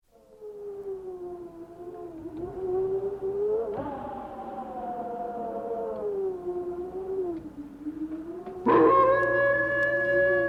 Scary Sounds of Halloween; Frankenbot With Sound Effects and Lights

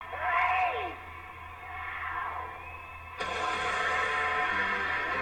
Rock n Roll; Disney Magic Band Scanner

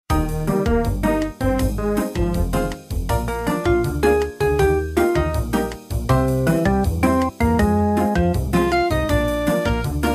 fast food music

